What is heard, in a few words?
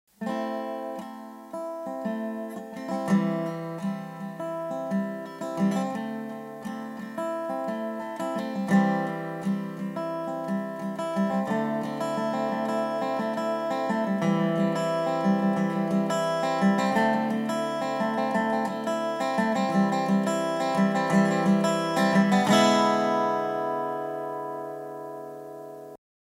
promo intro